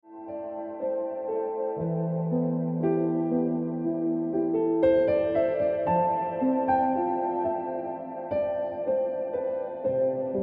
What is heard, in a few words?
Ringtone Piano Music